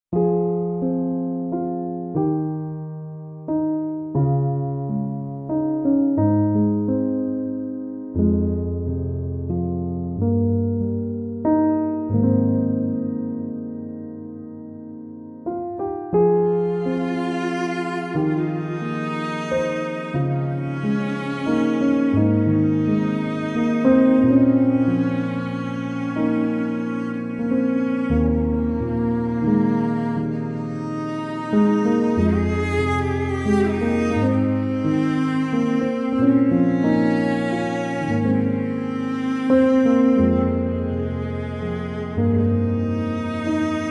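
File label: Gloomy, violin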